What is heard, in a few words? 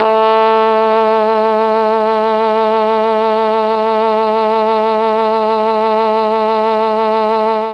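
A2 trombone trumpet, mellotron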